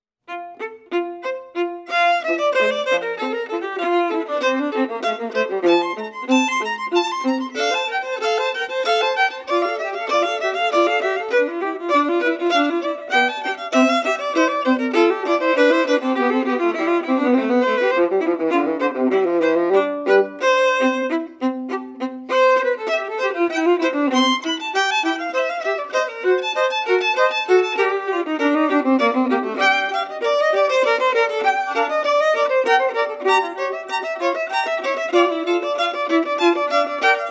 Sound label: Mic; Invention8